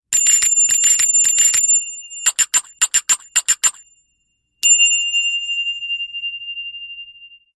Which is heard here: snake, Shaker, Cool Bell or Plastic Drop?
Cool Bell